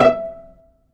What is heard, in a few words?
STR E4, STRING PIZZ; Best Service ProSamples vol.55 - Retro Sampler; AKAI